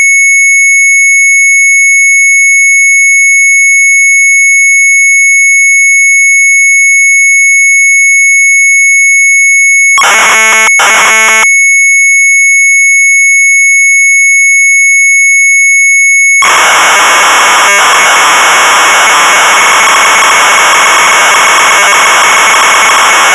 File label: Sharp MZ800 Tape; MUZIEK-MENU; retromelsarchive